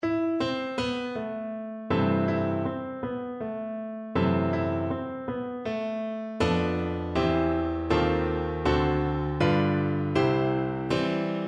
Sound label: tchaik piano